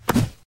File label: grab; Giant